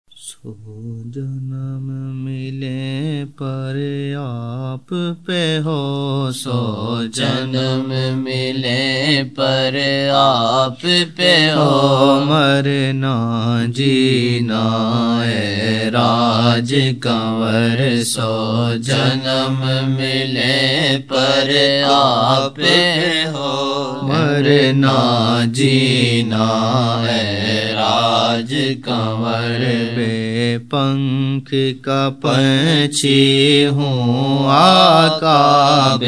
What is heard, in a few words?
naat; soo janam milain per aap saw pay hoo murna jeena ai raj kanwar